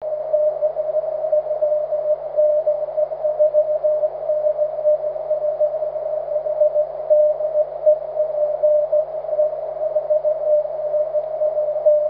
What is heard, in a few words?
cw, 23cm